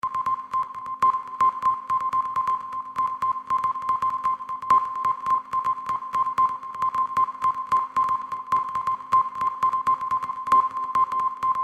Morse code loop, Rhythmic Morse code loop.; Electronics

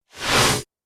Laser Whoosh; Whoosh Sound Effects

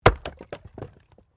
Wood Chopping Axe; Chopping (Sound Effects:Objects)

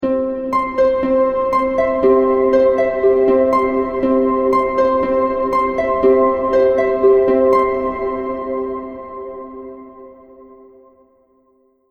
Harp Fantasy Scene; Musical Sound Effects

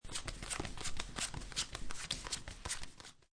Playing Cards Dealing Cards On Felt; Card Sound Effects; Casinos

